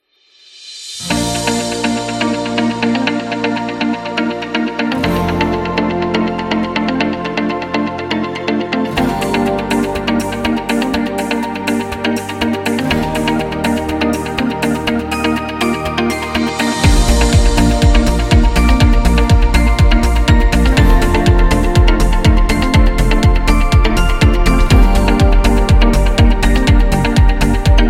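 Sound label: Food Upbeat, Kids background music; inspirational motivational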